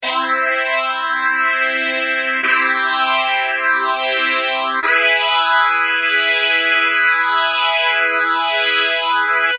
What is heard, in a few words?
JD990 brass, Mutron Phasor II